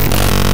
Glitch

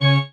marimba grave; ゆめ2っき

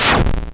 hit

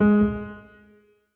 marimba; ゆめ2っき

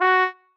marimba trombone; ゆめ2っき

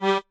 marimba chainsaw; ゆめ2っき

